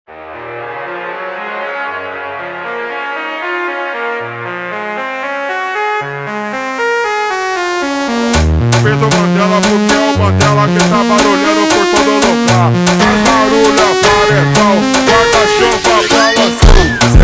Funk slowed

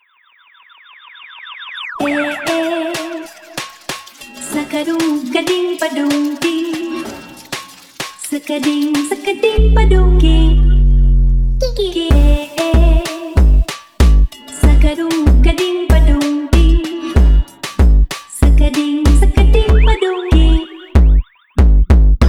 Automotivo ultra Slowed

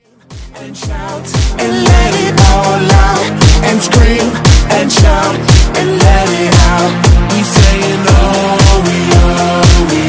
slowed

